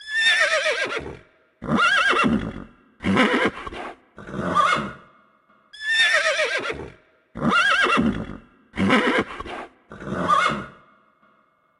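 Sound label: horse; kon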